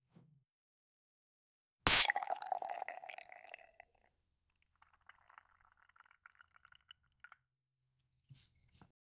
sonido de una botella, beverage

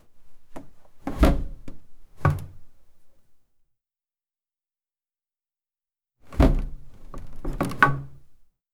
apertura cofre de madera; lid

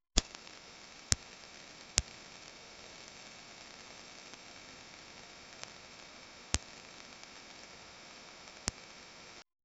sonido magico de luz

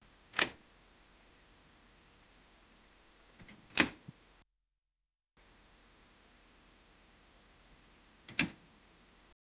una persiana cerrndose le

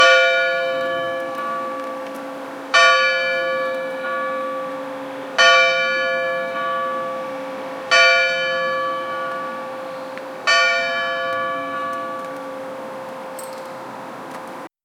campanas de iglesia marca, instruments

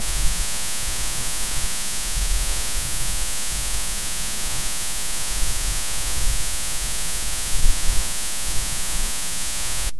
bend de sintetizador agre, synthesizer

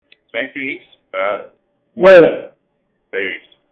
hombre apretujado, anxious

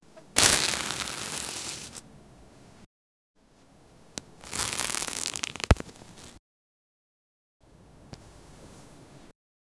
telon abriendose, theater

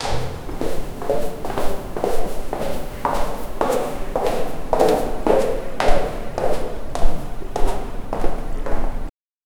pasos en la galera; house

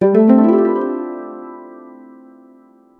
estilo 2 arpa romntica, harp

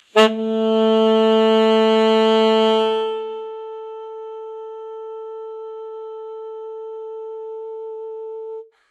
sonido de saxofon alto; saxophone

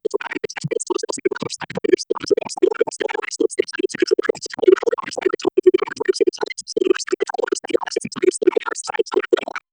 efecto de sonido, pixel art

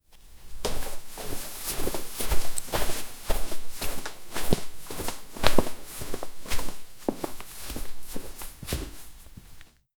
set de sonidos de ropa, martial arts

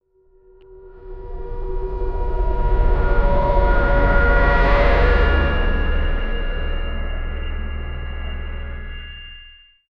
tonos ascendentes y metl; ascending

